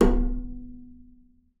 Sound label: BING B3, BASS FINGER; Xsample VOL-5